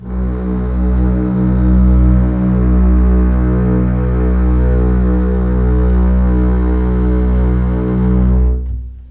LEG MF L, The Ultimate String Collection; East West

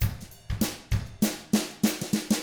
FUNKY, Drums

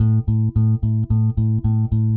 Eighth Notes A, Bass; Bass X

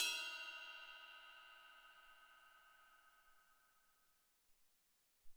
Bell Cym, Alesis SR-18

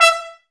Brass; Miroslav Vitous; Woodwind Brass Ensemble

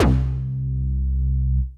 Synth Prophet Bass C; Electronisounds

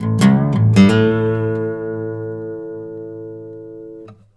BARI LICK, Blues Guitar; ILIO; Fretworks; 108 BARI LICK

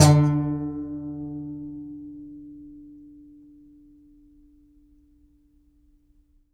C. Bass Balalaika, Plucked Strings

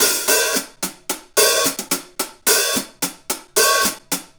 Hi Hat Pattern; Essential Percussion; Hi Hat Patterns

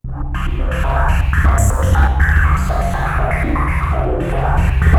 ATMOS; East West; Global Sounds

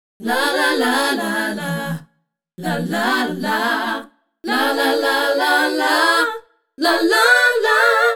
Female Group, Hip-Hop and RnB Vocals

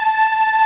Ending loop

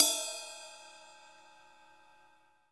CYM Ride; Best Service Gigapack 2

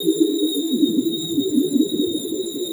BASE, ELECTRONC

